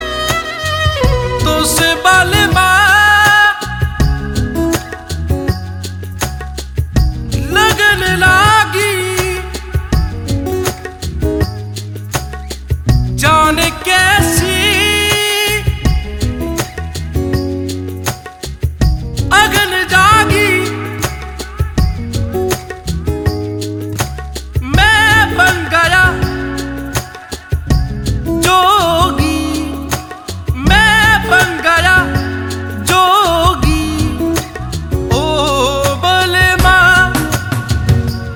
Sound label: Sufi Hindi